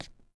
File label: thug fs concrete, footsteps